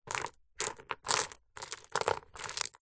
foley gemstones several small opal amethyst jade short movement handling on surface